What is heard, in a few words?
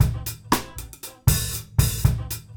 groove, Grooves 99-132bpm